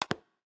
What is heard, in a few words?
square lock